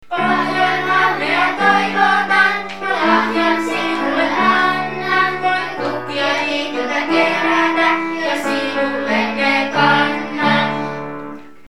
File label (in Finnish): Syntymapaivalaulu